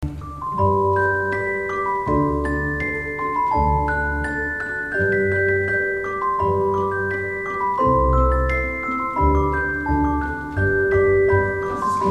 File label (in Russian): Garmonicheskij sluhovoj analiz, solfedzhio